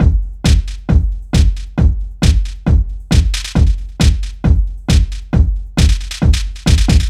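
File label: drum loop 135, right; House; Best Service ProSamples vol.10 - House; AKAI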